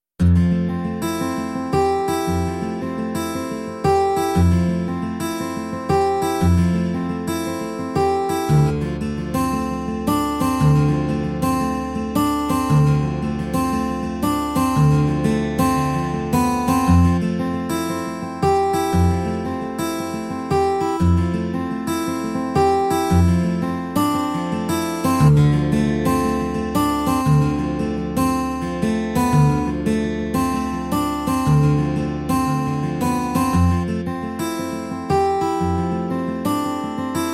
GT STEEL SWTCH

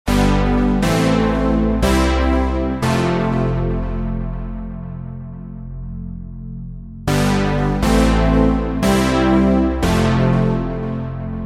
ZEBRA 80 RIFF

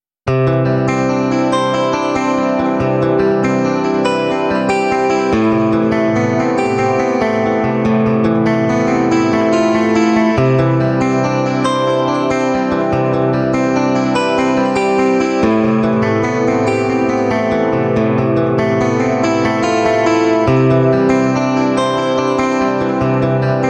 GT COMP DREAM

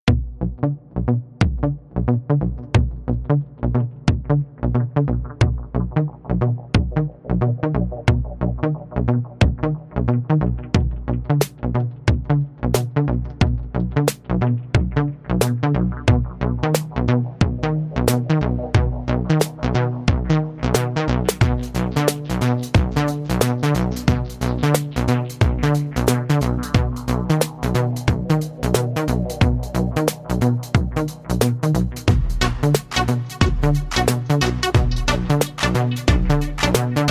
SYNTH1 GROVVE

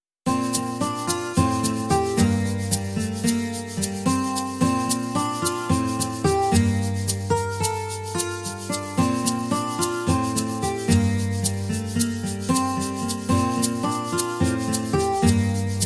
GT SIMPLSTEEL

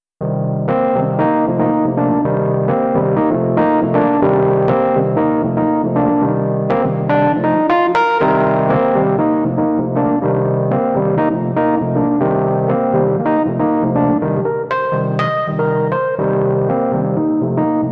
NS2 JAZZ

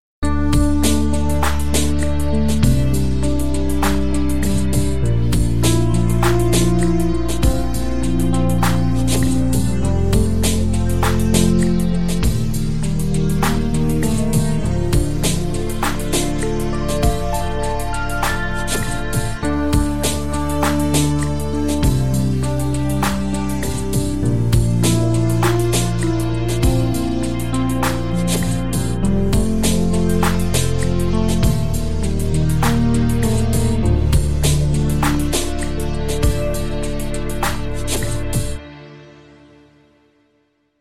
SUB GRASS

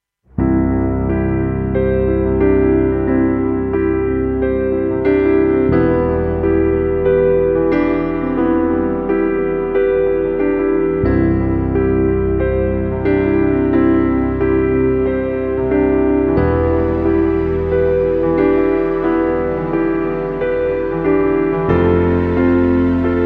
PIANO EXPRESSION

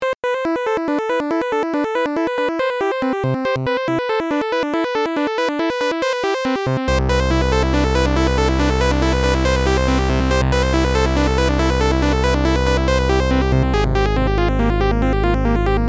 COVER DREAMSTATE SAW

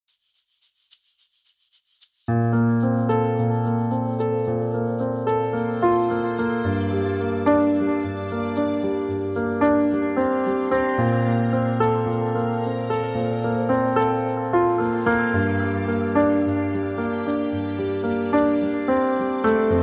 RADICAL PIANO; RADICI

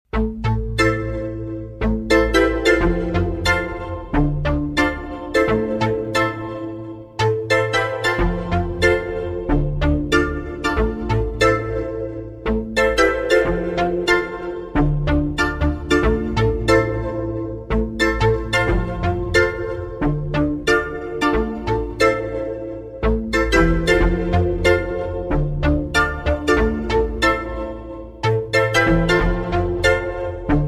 SPACE STACCATO

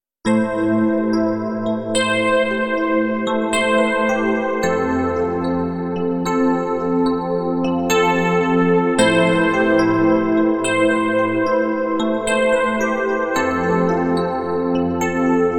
E PNO 3; PLG150DX

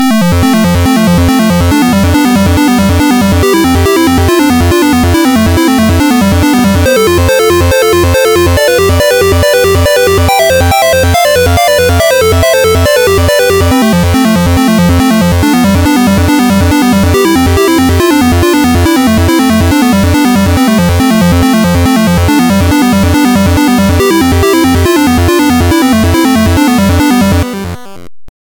ARP

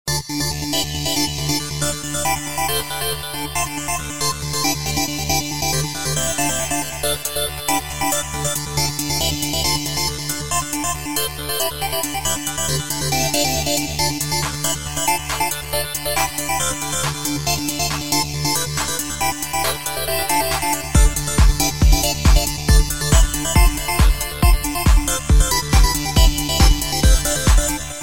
SUB DOUBLE

